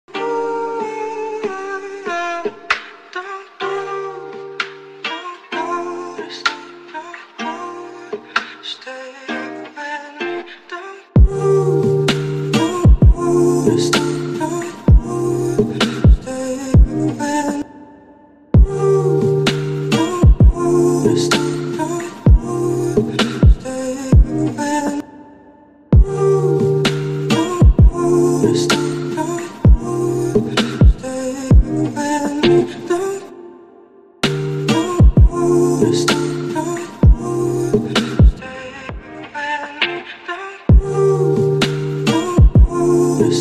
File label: Instrumental